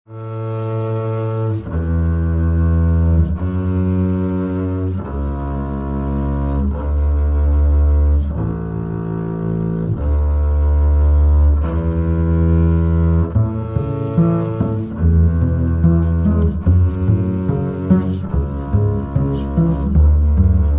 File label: Canon in D Double Bass